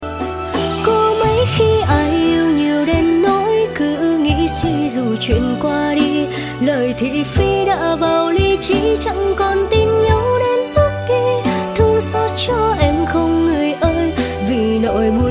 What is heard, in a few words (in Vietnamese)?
Cover Giong Nu